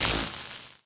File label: spark; smite; chronos